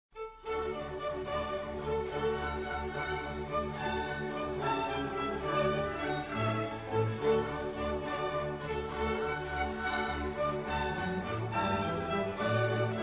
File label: horn